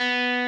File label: Arp.